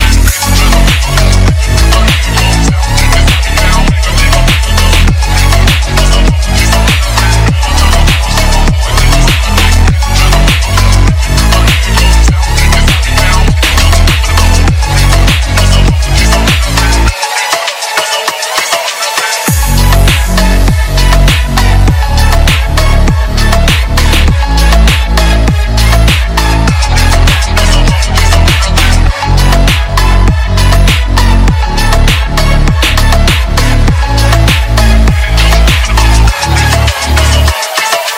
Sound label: secret phonk; euphoria